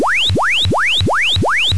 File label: generator sweep mono